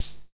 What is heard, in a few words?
Hi Hat, drums; Sonic Foundry (Sony Creative Software); Drums & Drones; Construction Kits; Squeeze Kit